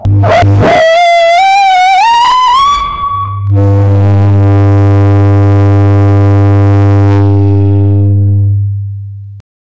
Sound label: bass boosted screaming